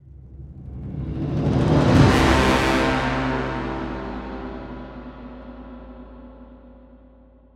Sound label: Game Over; Minora-Game; Sound-Artlist